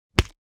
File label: Punch